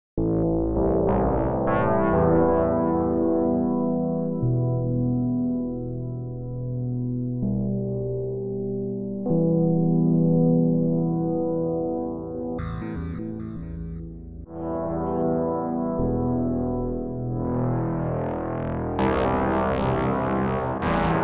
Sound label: Slow Synth; Clandestiny of Elsie